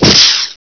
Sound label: gauss fire